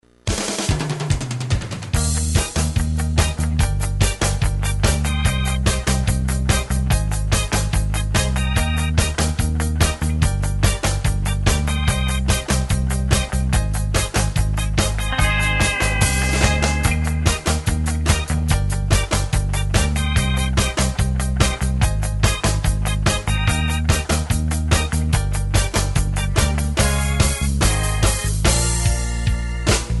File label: oldies; TELEPHONE MUSIC